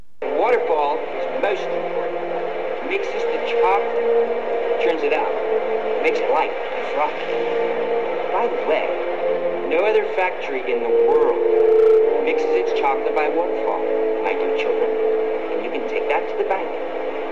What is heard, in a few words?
waterfall; wonka